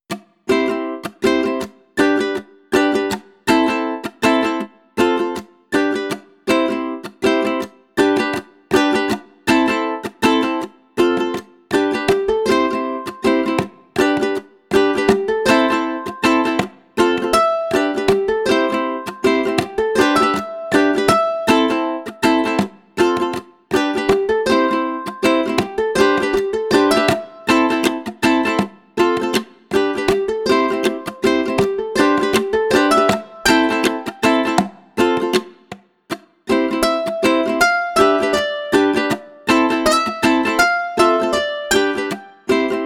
Only Ukulele

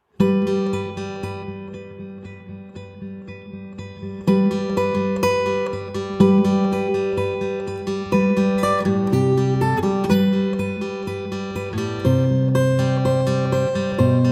Jingle Bells; carol of the bells

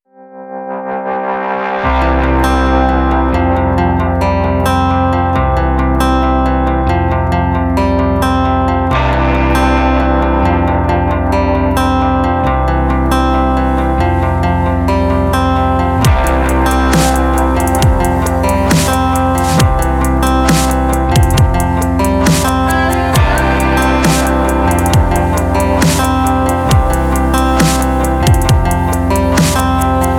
Uplifting Day; cinematic background music

